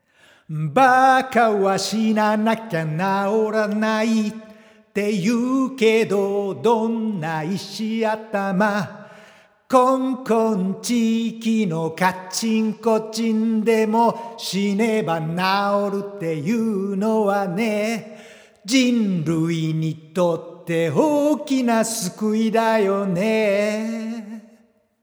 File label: vocal